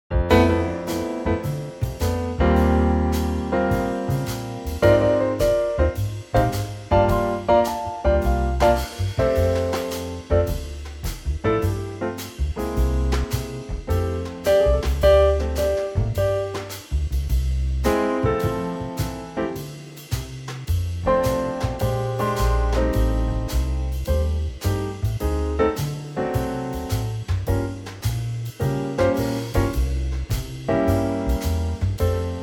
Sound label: trio F